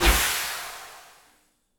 Aircrafts Depressurization; DCS

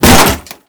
television fall; Call of Duty Modern Warfare; physics

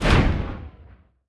depth bomb; World Of Warships

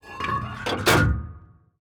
gun reload; World of Tanks

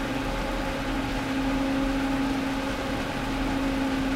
centurion engine rpm 33; engines; War Thunder

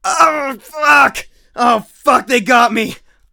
hurt neardeath; Escape from Tarkov

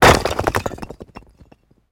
building fall small; tanks; War Thunder

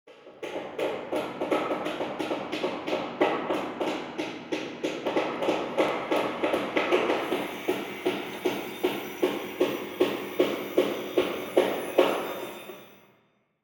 hangar layer

